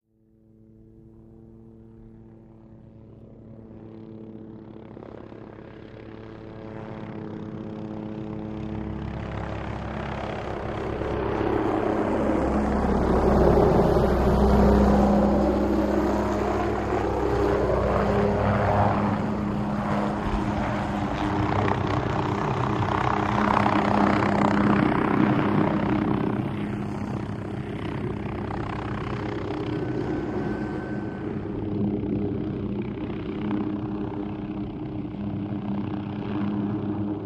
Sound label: Loach Circling, little bird